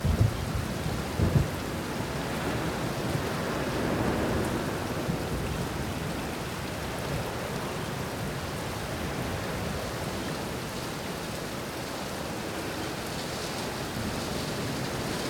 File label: GLEN NIGHT WILD